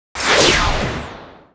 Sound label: DEATH HACK